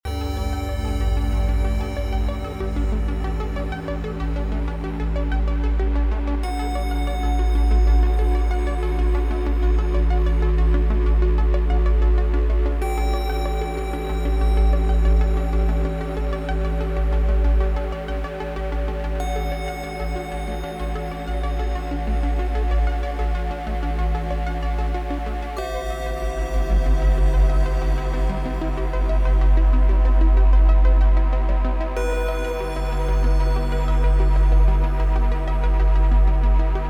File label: One Synth Loop; Dune 3